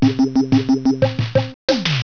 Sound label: hisc Song; BeamWars 2.3.2; Arcade